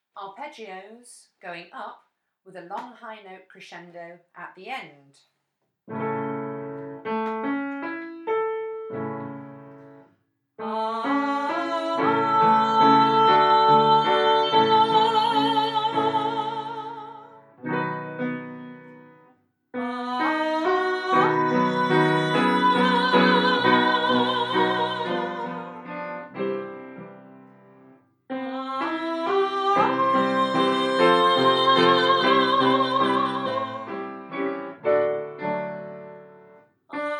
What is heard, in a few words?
arpeggios with long high